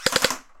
Toy Pistol

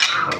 Pickup Ammo Shock

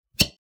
Suction Cup

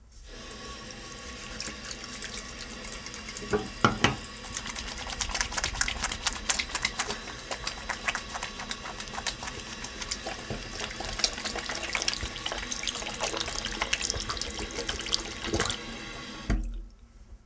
Washing Hands

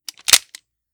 22lr Revolver Handling Clicking Foley

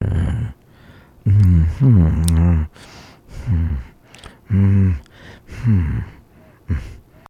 Male Voice Hmm Mmm Mm Lip Smacking

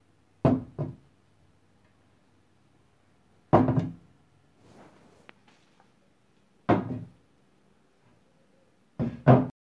Porcelain Statue On Wood

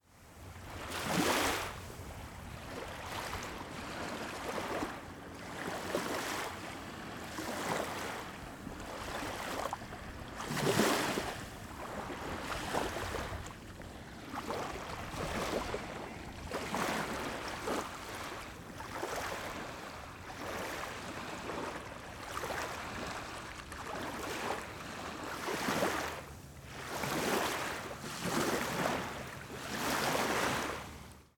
Gentle Surf Rolling On Sandy Beach Close Up

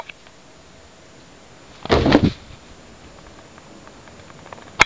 Fwump

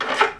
Picking Something Up From A Table

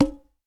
Percussion Hit

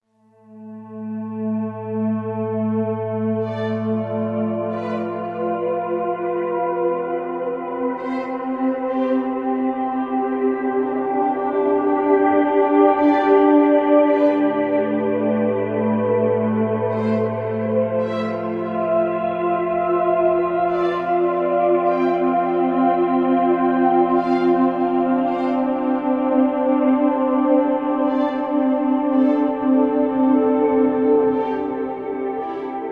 Ethernal Vision Ambience

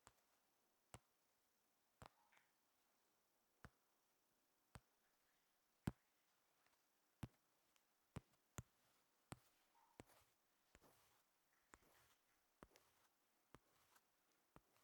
Ipad swipes

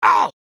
Male Fight

